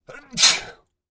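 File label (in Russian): чихание короткое мужское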